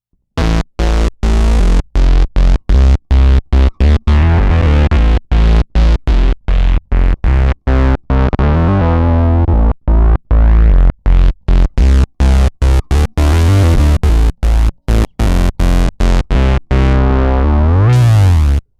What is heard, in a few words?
Electro Harmonix Bass Mono Synth Laser CTRL Full